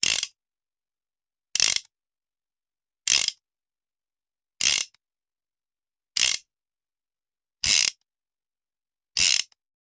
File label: Hand Gun Foley; Sound Ideas; Guns